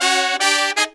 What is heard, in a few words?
Pop & Funk Brass, 110; Best Service ProSamples vol.25; AKAI